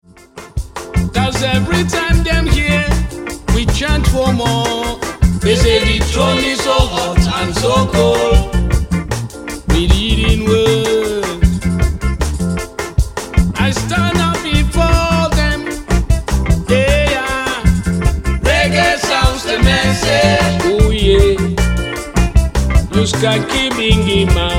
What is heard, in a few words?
Reggae Sounds